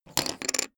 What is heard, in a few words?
poker chip stack small fall down wobble